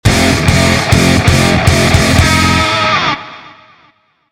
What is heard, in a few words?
intro guitar